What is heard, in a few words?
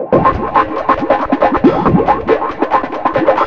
FX BEAT